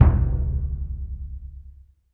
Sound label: big burundi, African Burundi Drums